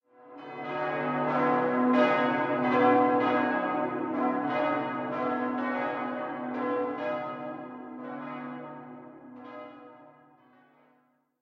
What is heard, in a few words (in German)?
Glockengelaeut; Klang